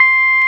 Roxichord, E.Piano

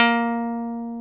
A#2, PICK BASS; AKAI S-Series CD-ROM Sound Library VOL-7

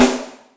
Pwrpcrim, Yamaha DTXpress, Acoustic Snare

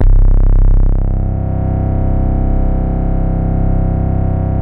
PHAT BASE, BASSES; Masterbits; Vintage Voltage

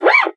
FRET, FINGER MUTE; Xsample VOL-1

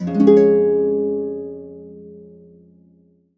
HCDBMAJ F, Complete Harps; USB Soundscan vol.62; AKAI

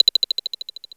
CLICKER, drums; Big Fish Audio; Synth City

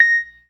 PERC, ORGAN; Keith Emerson Signature Series

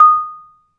MARIMBA; ILIO

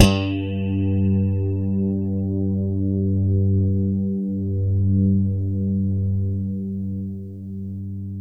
Warwick bass G2; Masterbits CLIMAX 7

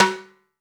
timbales

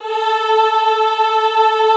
EHH A3, Classical Choir; Best Service

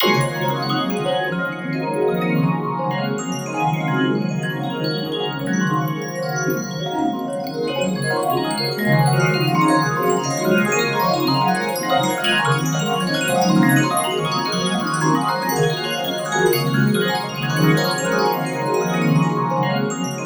STAR CHIMES; Distored Reality 1&2

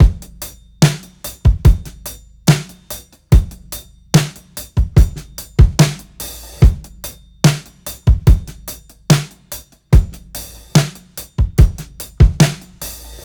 FAT 145 16TH BEAT, drums; Basement drums vol 2

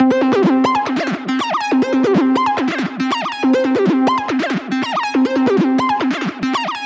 140BPM C; Vengeance - Essential Clubsounds Vol.4

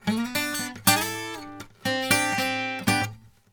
Blues Guitar Samples; ILIO; Fretworks; LICK

